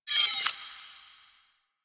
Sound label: Sword Enemy UnFrozen; Weapons; Melee; Warframe; Orokin Weave Sword; Weave Boss